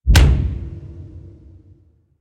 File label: Fast Hit Trailer - Dry; Trailer Pack